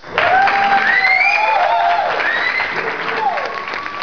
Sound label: applause